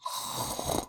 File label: snore start